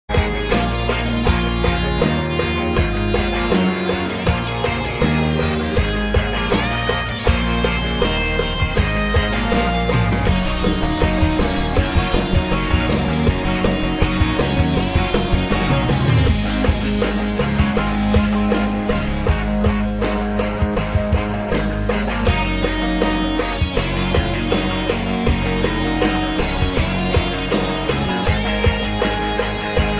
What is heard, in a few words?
Low carbs more cow Bell; Power Drift